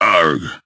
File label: hurt